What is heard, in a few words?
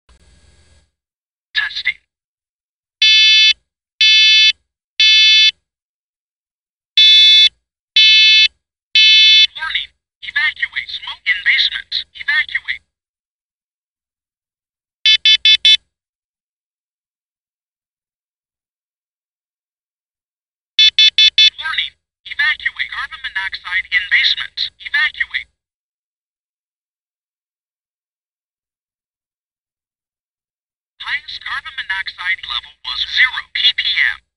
alarm sounds, carbon monoxide detector; SCO500B